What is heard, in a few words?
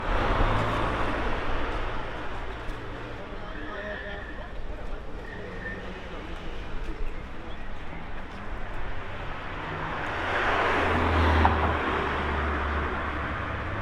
harbour traffic; whitstable